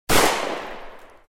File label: gun shot; out of reach treasure royale 2020 gamerip pc